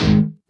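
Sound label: guitar (heavy chug; Sonic Foundry (Sony Creative Software); Ugly Remnants VOL-2; Single Shots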